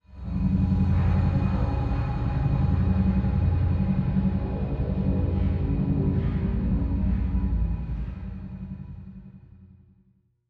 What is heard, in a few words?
dimensional machine; rifts; Stellaris; astral planes